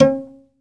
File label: SOLO, Strings; ILIO; Synclavier Strings